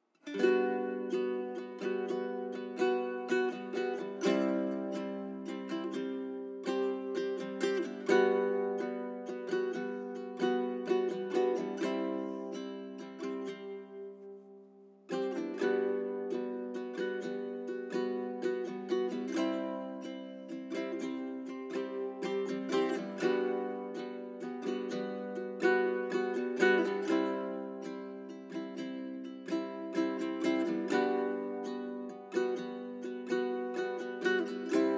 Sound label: Uke